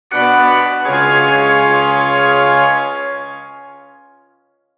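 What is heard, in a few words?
music stinger lootbox rare; heroes of the storm full gamerip 2015; hallowsend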